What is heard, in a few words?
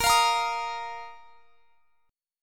Bb Suspended 4th Bb down; strummed